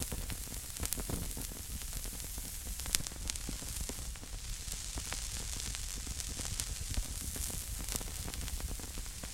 Flame Flutter Sizzl

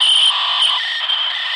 radio jamming

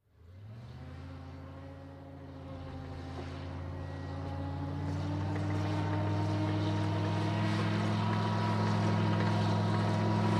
APC Mvmt Stop Idle Off, armored personnel carrier

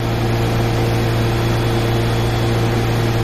Generating Station, generator

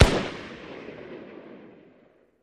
Musket Shot Single

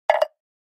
Flash Gear Hollow Met, flash gear

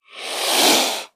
Cobra, Indian Hiss. Close Perspective.; snakes

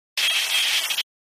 Noise Piercing HF Scr

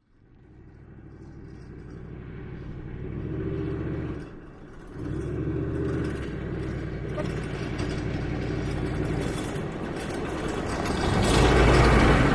Humvee: Various Driving Maneuvers.

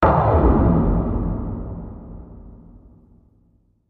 Bass Impact Low Drum; impacts

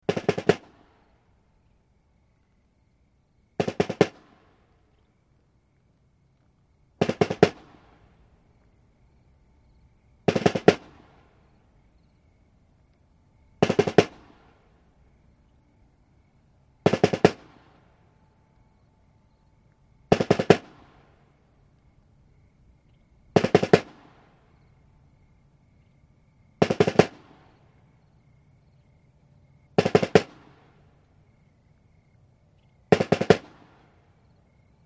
Single Snare Drum, drums civil war

